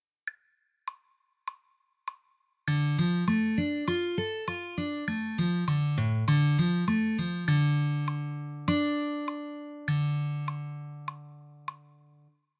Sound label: C minor Arpeggio Form